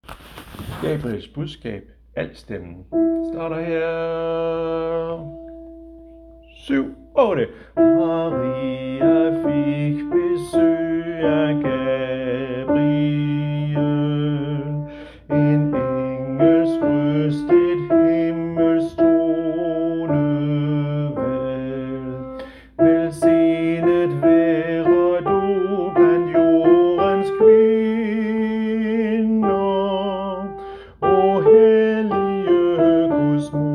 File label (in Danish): alt, koret; Gabriels budskab